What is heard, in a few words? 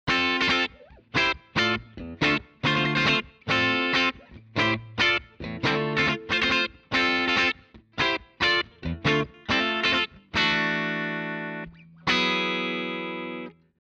guitar pan l